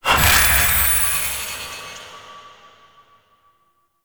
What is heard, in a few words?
Puzzle Confetti Burst; Warframe; Ambience; Duviri; Bombastine Encounter